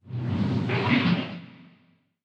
water lap; nuke

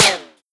npc armor, bullet impacts; physics; Half Life Model Pack

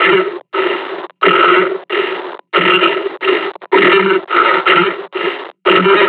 breathing, combine charger; Half Life Alyx

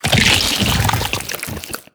bite digest, barnacle creatures; Half Life Alyx

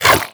grunt, headcrab, creatures; headcrab classic; vox

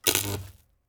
zap, energy; Counter Strike Model Pack; CS:GO

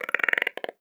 vox hang, creatures, snark

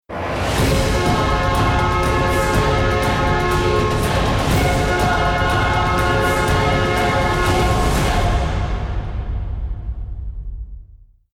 bomb ten sec count

